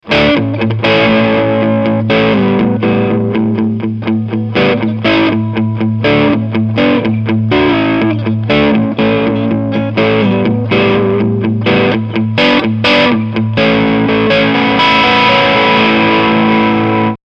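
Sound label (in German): Beide SC Crunch ohne Booster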